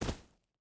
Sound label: footstep barefoot gravel jump land; Rust